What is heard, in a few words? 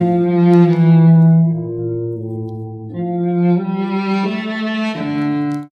strings, Sad